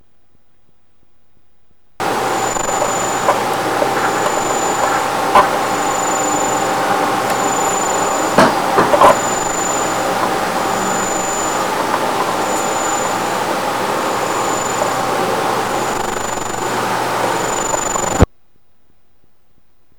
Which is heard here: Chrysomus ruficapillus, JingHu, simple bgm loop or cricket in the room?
cricket in the room